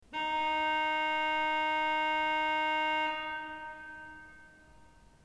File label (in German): ton, orgel